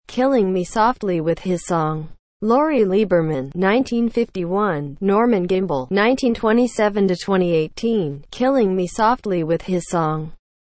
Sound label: strumming